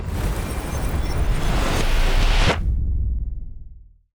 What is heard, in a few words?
action activation astral siphon; astral planes